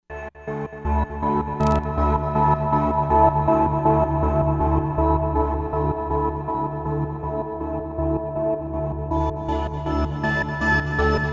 Padestrians

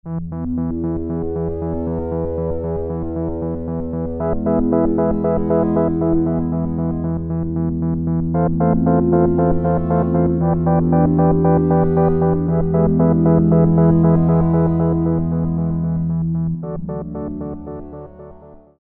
Pulsing Sweep, Roland JP-8000; Factory Performances

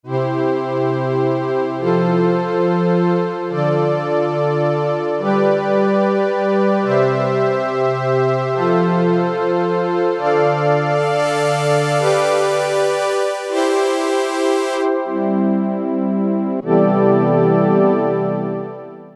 Pad, Alesis Micron; Factory Programs; Oberpad

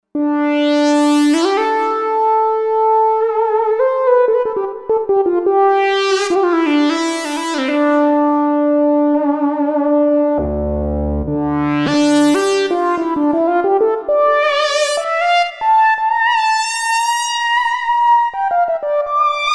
Lead Earth Lead

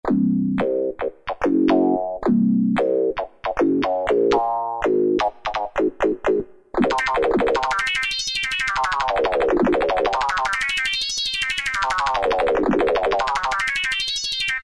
Chrome Rez, Korg N364; Factory Prog demos

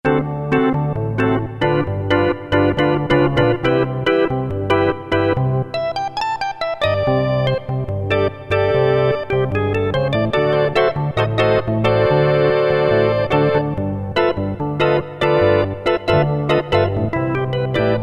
Elec Org 3, Roland MT-32

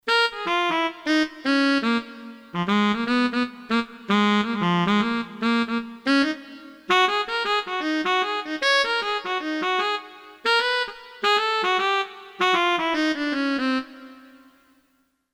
ALTO SAX, Korg DSS-1

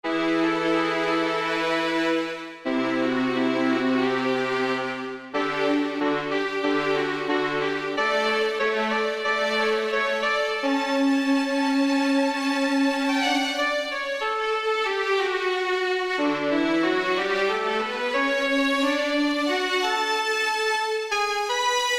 Pop Violins Section; IK Multimedia; SampleTank Free

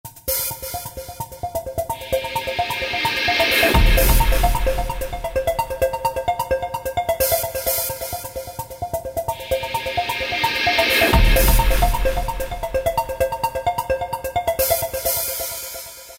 Deep Space, Korg ES-1; Factory Patterns

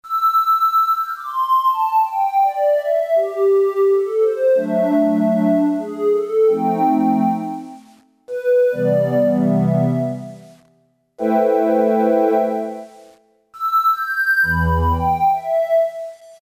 LO-FI SQUARE, Roland SC-880; Factory Patches

